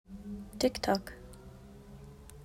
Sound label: Tick-tock